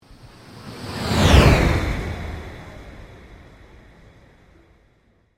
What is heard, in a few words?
Sweep Organic Slow